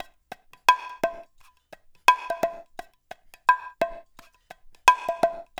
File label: percussion loop